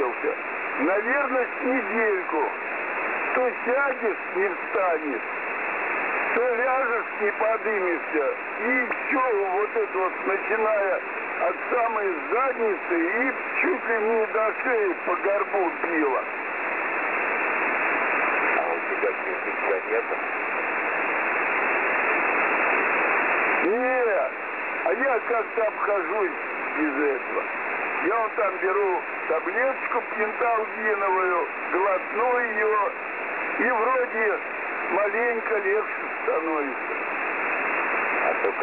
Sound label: 27190.0kHz